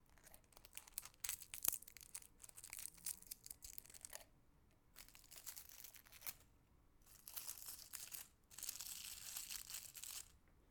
Dry Leaves

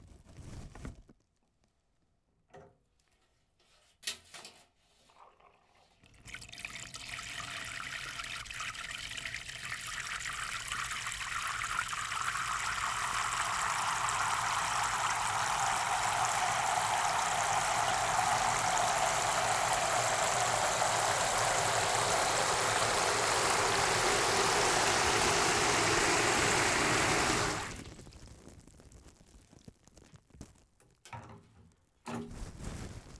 Water

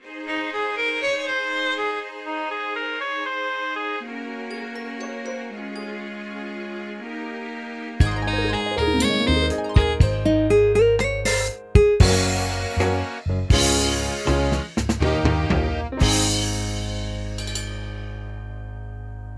Short Intro

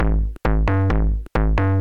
Tech loop

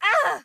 Argh Woman

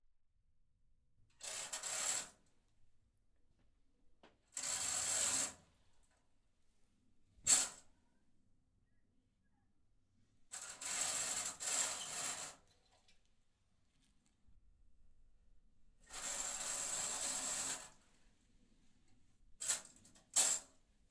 Drawing Curtains

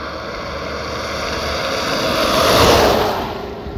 Passing truck

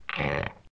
Grunt

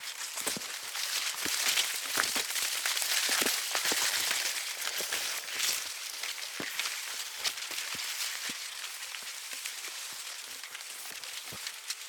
Walking Through Corn Field

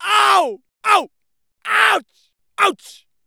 Scream Auw Ouch

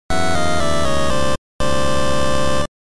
Game Over

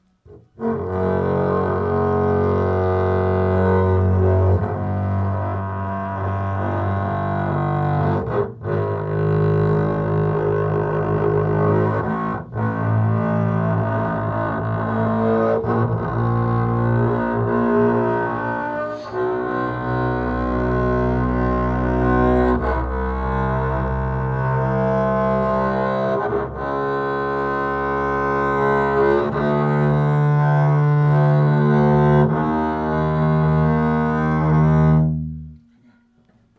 Double Bass Sul Ponticello

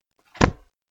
Book Closing

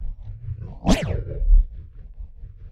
Wavy Swoosh

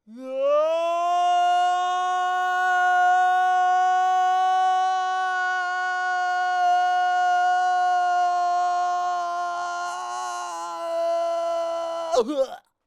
Falling From Sky High

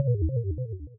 Retro Death

